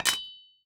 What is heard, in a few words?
mine metal